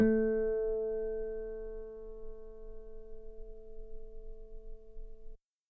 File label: guitar A4 very long forte harmonics; bananenkeyboard